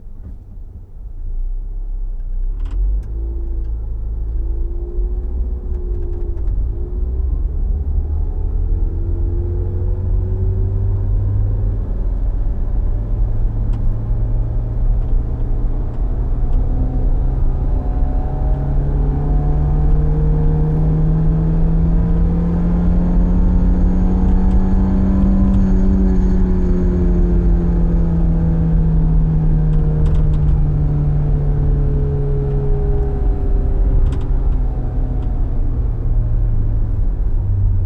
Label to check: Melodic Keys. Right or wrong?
Wrong